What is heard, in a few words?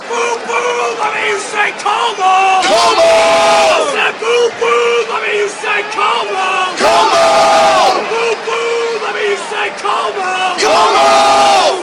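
boom boom, football chants; comeau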